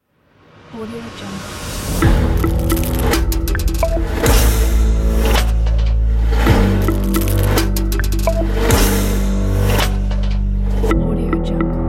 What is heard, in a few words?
modern ambient logo pack